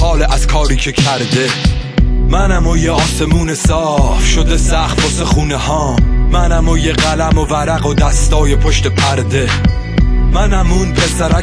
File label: Saz